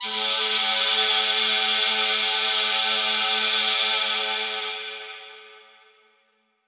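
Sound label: Echo Processed, alarm siren samples 125bpm; musicradar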